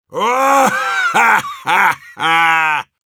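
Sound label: laugh happy, Russian; Heavy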